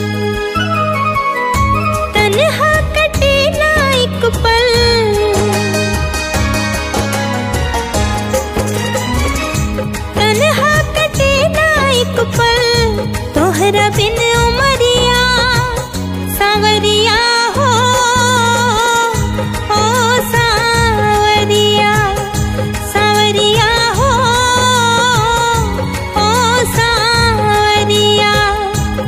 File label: BHOJPURI RINGTONE